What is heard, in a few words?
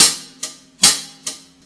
Hihat Loop 72bpm